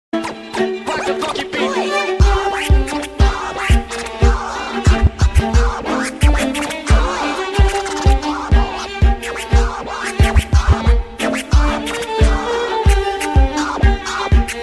dj violin; hip hop

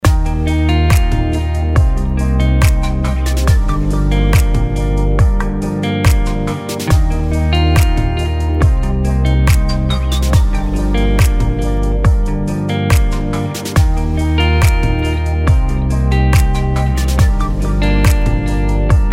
guitar

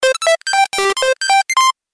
Tone

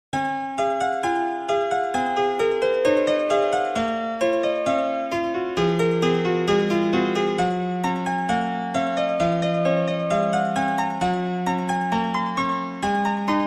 canon in d

